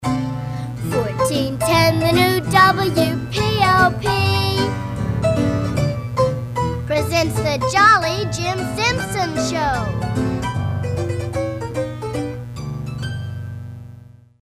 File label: jingle; Jim Simpson